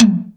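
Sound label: DRUMTRAKS high tom, Drums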